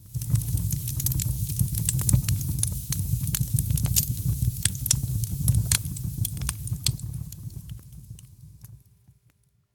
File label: Fireplace Crackling Christmas Ambience